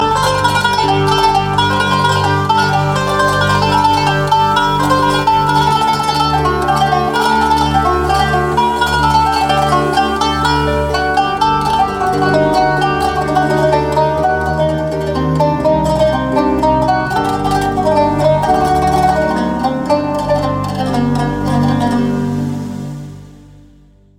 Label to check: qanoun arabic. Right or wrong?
Right